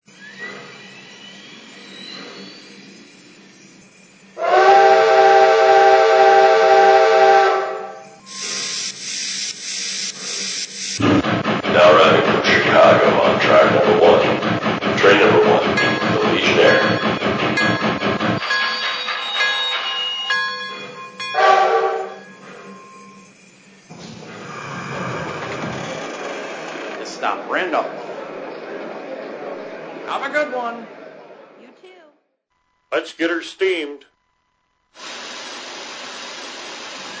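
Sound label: Pacific, locomotive; CGW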